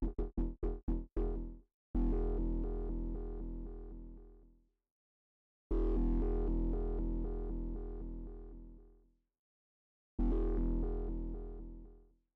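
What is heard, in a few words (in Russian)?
звуки арпеджированного синтезатора, низкий тягучий звонкий звук; 0 coast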